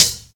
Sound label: tamed closed hi hat sample d sharp; hat samples